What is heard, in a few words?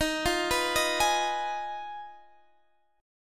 G sharp Minor 6th D sharp